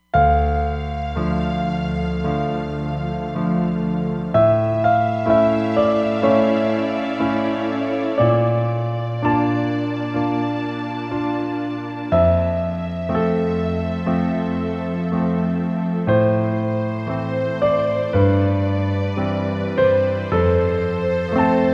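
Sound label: Warm Strings Piano, Roland Fantom XR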